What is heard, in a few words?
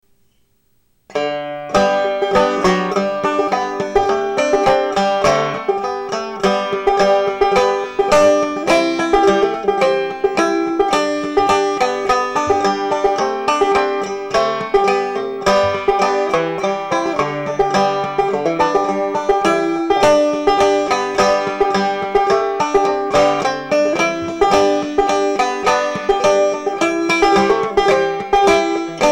yulesong auld lang syne clawhammer banjo